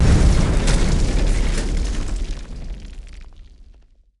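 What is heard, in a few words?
c4 explosion debris; weapons; Counter Strike Model Pack; CS:GO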